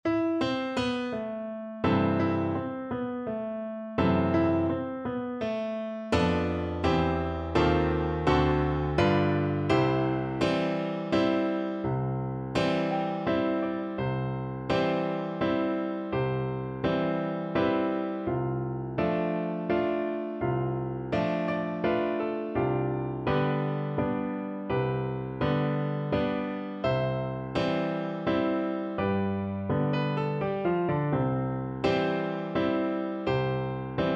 Theme from Piano Concerto No.1 for Recorder

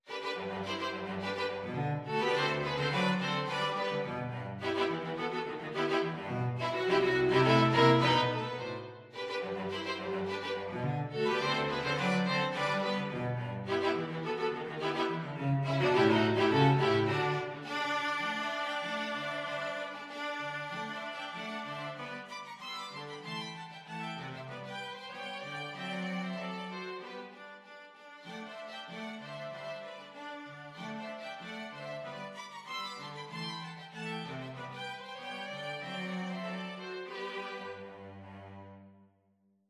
string quartet

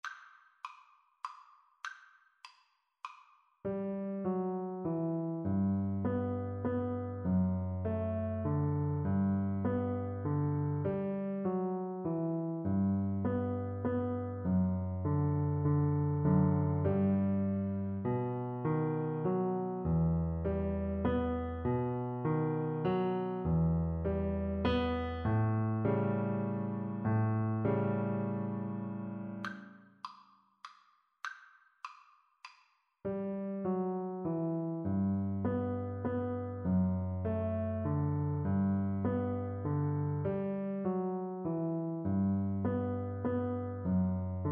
piano duet

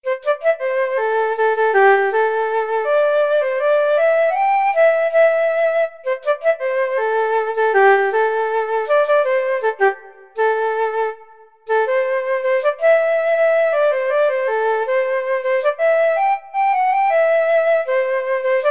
Funk Study, flute

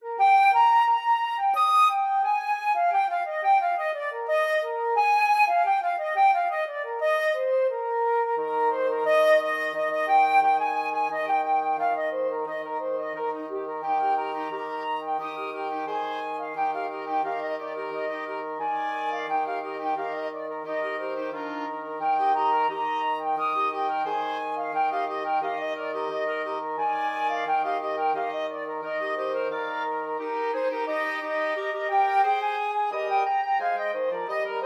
flexible mixed ensemble, 4 players

